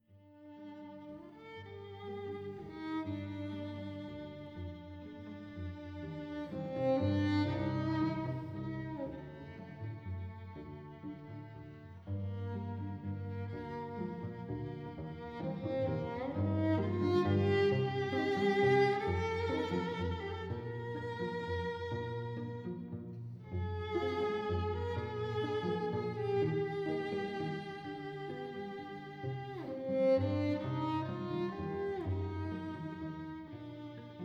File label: trio